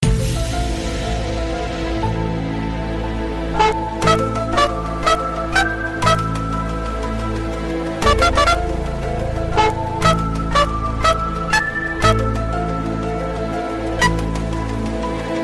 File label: trumpet gets spooked; skull; trumpet soundboard